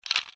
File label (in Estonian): fotoaparaat